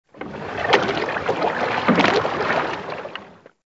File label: water lap; open toontown resources